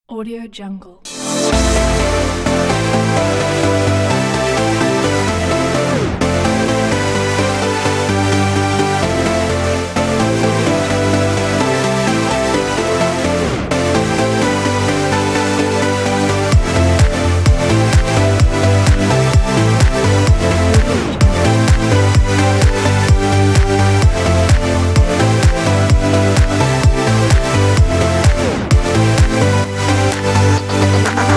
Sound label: energetic electronic club track; wave-studio